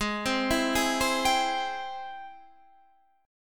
G sharp Augmented Major 7th G sharp Guitar Standard; G#+M7 arpeggiated; JGuitar